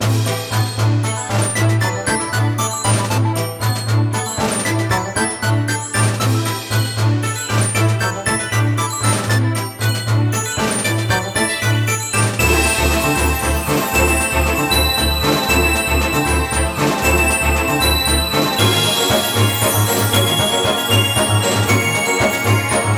bgm sea battle

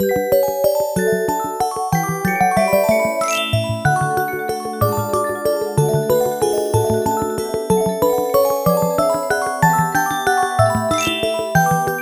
Underwater; New Super Mario Bros. 2; Gold Ring

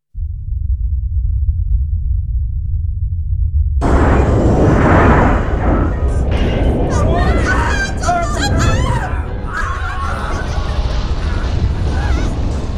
collapsing ground

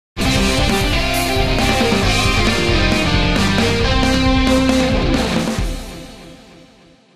Boss Defeated Message; Ghost 1.0

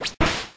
Quick Attack

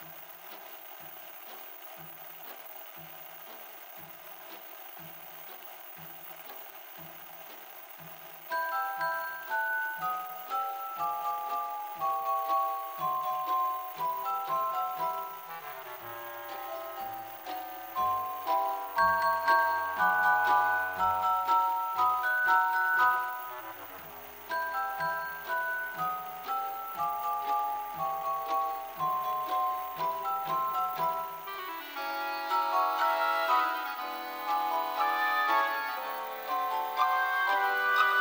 SFX Radio

